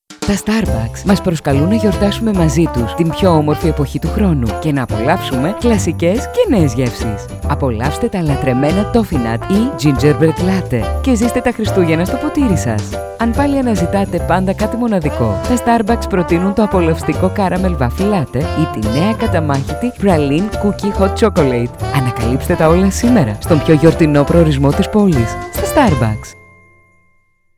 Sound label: Christmas Jazz; STARBUCKS